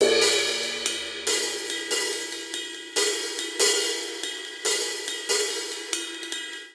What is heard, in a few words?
Syncopated Folk Cymbals Only 142 bpm; MIDI Clips; Ableton; Factory Packs; Drum Booth